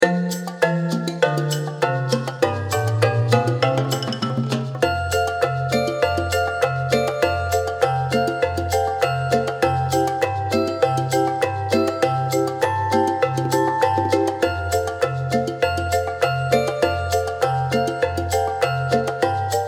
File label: Walking Marimba